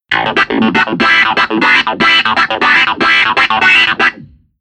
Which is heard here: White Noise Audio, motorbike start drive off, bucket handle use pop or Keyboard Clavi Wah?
Keyboard Clavi Wah